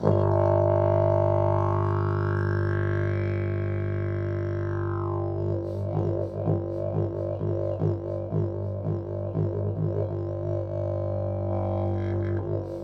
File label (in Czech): briza ultrabass, didgeridoo; 34 mm; Ladění : G; Tomáš Dufek